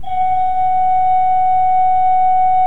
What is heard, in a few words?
F4, Cathedral Organ Koppelflute; Propeller Island